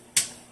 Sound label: one pop